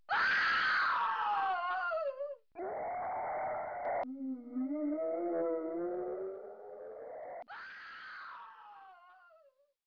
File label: scream